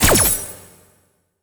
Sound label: Duelchain Stack